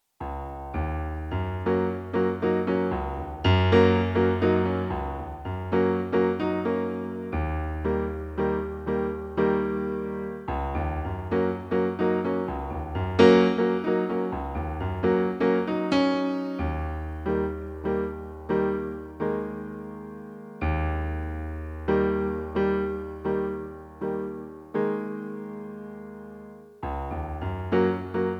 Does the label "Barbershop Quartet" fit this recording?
No